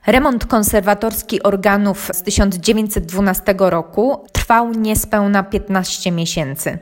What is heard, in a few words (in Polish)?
organy